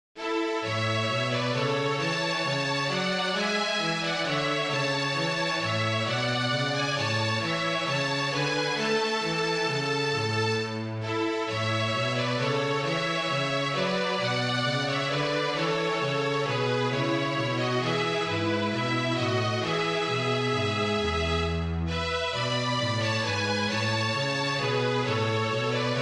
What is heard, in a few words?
Gigue trio, string